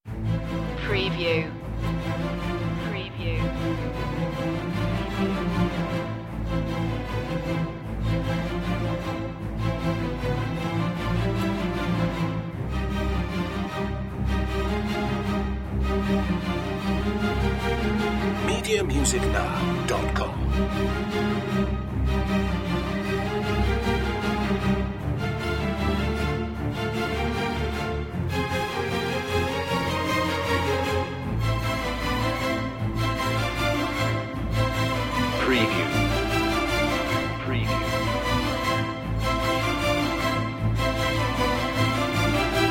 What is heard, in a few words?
storm riders strings mix